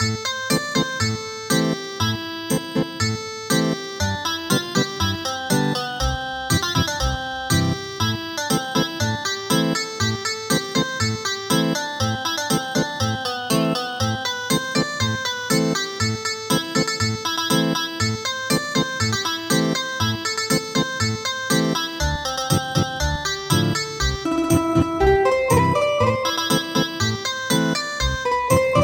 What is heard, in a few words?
Ethnic Guitar; Arohnee